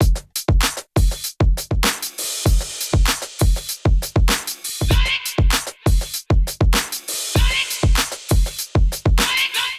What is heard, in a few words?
Groove 97 BPM, Drums; MIDI Clips; Full Lotus; Ableton; Factory Packs; Chop and Swing